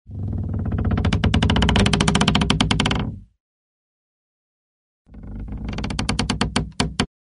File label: creaks creak wood pressure